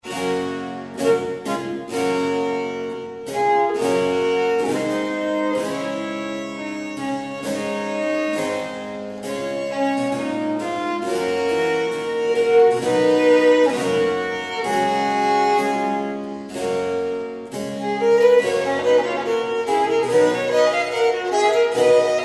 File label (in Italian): Sonata IV per il violino per sonar con due corde; Biagio Marini; NAWM